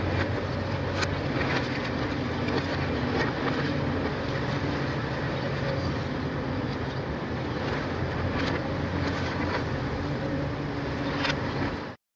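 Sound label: construction claw at work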